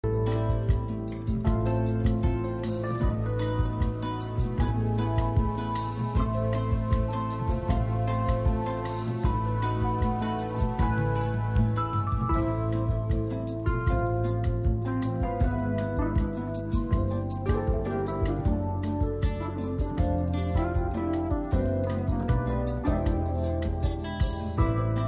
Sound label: 03 Minor Samba, 100